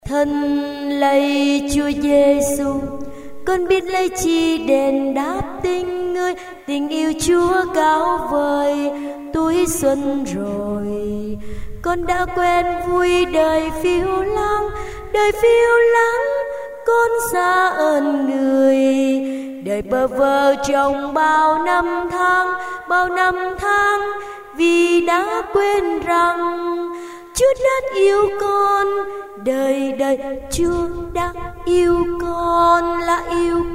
basso

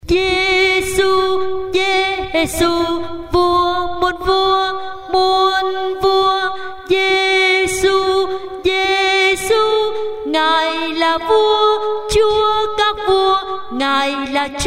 alto

